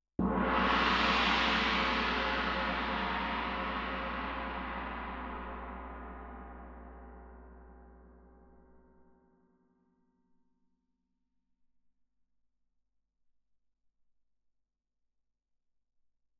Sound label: Tam-Tam; Tam-Tam Main